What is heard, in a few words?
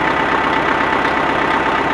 JCB Engine; RCXtreme; Environment